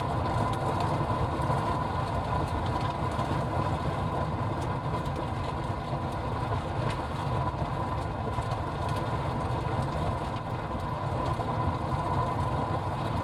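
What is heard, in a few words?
ambience tires asphalt town loop; Blind Drive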